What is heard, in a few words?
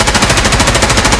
m4 fire indoor loop; Escape from Tarkov